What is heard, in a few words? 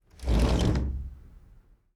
window open home; anemoi